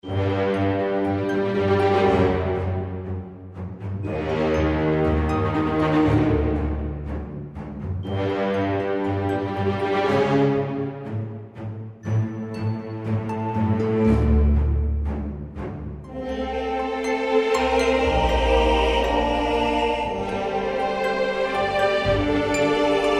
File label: base game music; Crystal Furnace